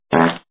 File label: fart